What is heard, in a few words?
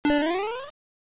jump lol